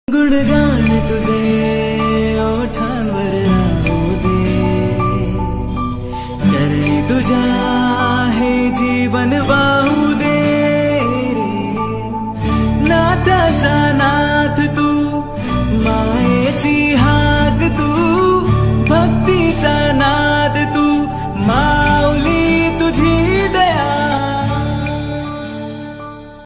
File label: flutes ringtone flute song flute ringtone; ganpati; lord ganpati bappa ringtone